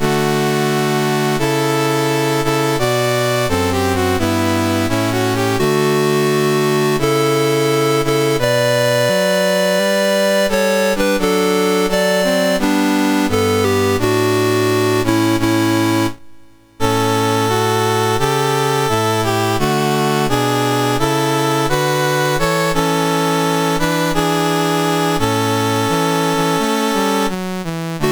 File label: Bahamas, National Anthems, MOS6581R2